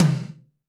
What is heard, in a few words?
TD-20 Tom A, Roland TD-20 Toms